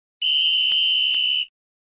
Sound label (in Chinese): shao zi red